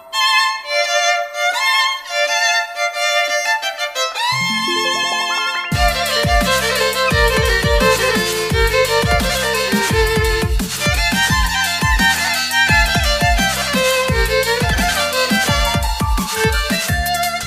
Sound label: Dubstep